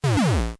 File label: firework